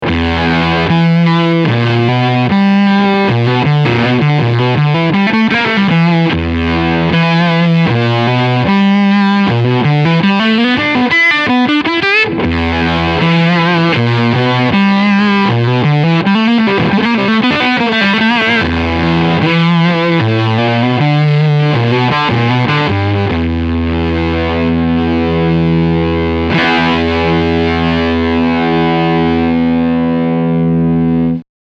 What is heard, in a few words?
Harmonic Fuzz